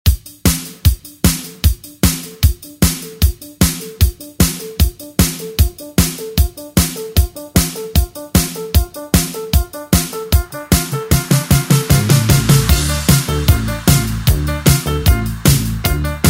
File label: Oldschool Redrum; Deejay Hit